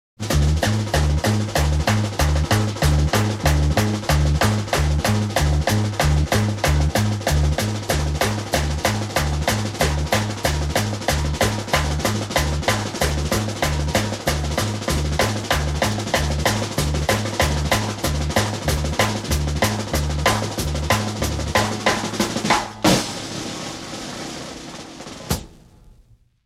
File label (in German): Musikeffekte Action